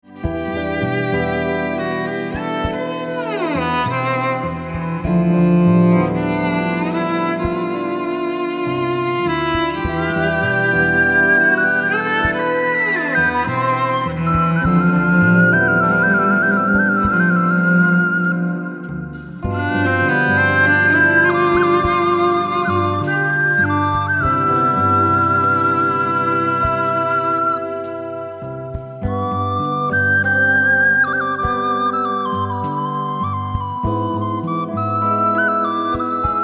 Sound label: Cello Circles